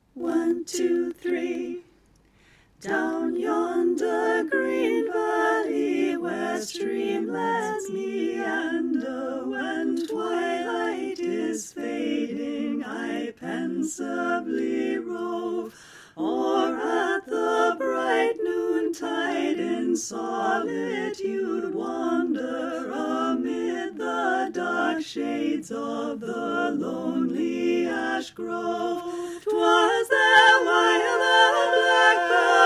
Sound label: bass panned R